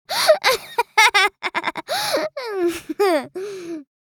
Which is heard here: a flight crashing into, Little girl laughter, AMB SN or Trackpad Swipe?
Little girl laughter